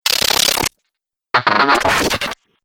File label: Dubstep Scifi Effects